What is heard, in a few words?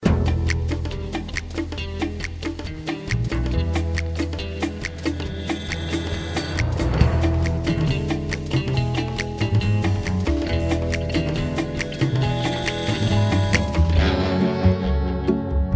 Bgm Ingame